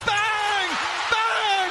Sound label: BANG; Mike Breen